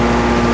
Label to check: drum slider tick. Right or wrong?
Wrong